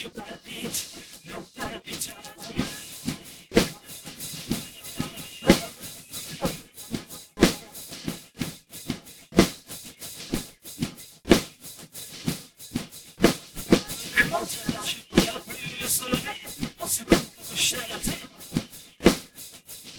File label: percussive